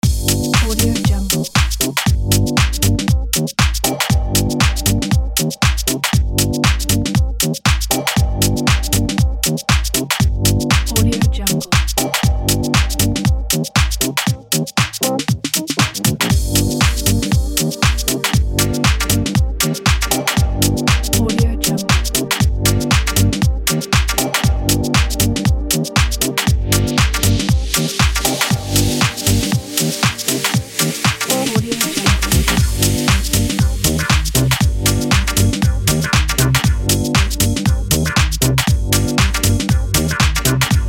funky loop; get funky loop

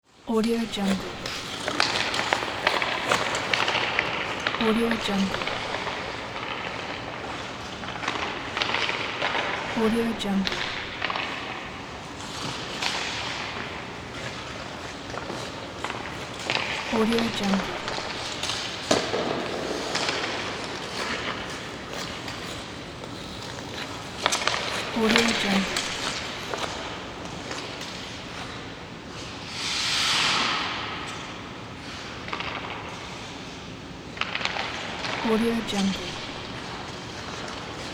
hockey

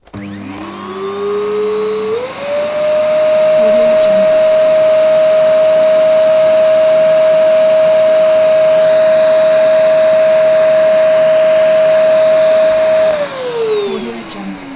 vacuum cleaner

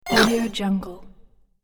little creature game hit

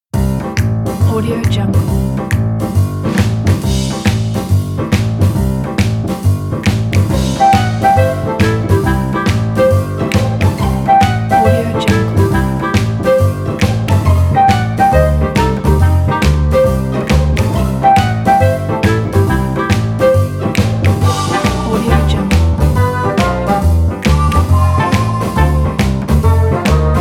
funny music

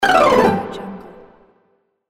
cartoon ending

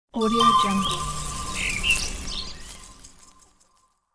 orchard bless spell

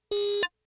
tonal beeps modulated ring various pitches ui; prosoundfx

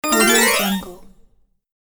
videogame health potion restore life

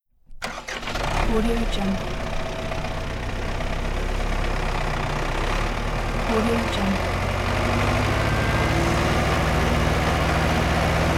tractor external start stop driving; tonwagensfxlab

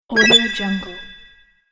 collect swoosh bonus

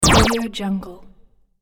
classic gaming hit

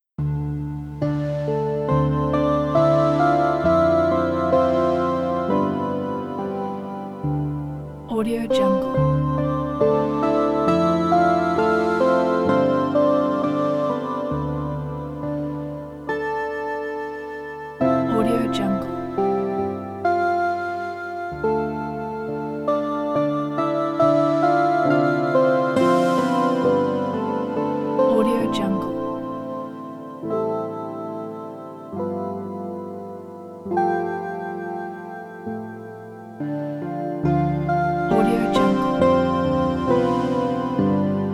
forest bathing

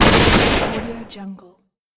a gun shot single

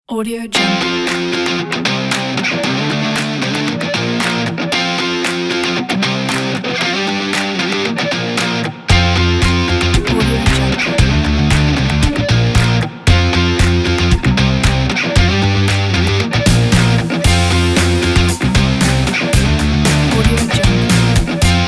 upbeat motivational pop

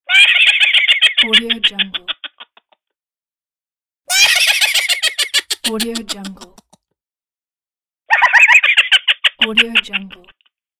cartoon laughter over cell phone

